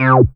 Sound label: syn c, Dance Synths, Acid Bubble